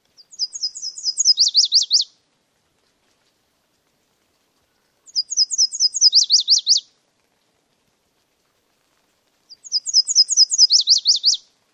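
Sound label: songs, bird; CA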